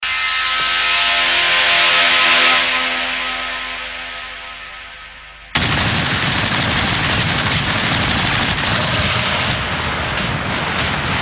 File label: Gun Safety lock